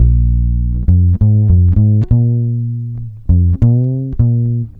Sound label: bass, Loops